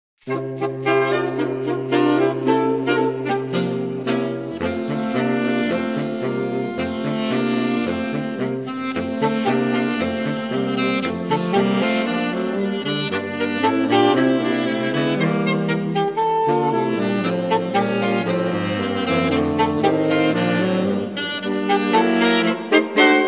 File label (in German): glockenklang